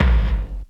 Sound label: airy, Kicks Soft